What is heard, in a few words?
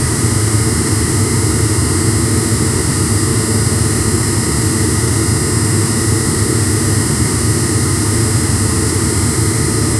loopable mechanical flame; fire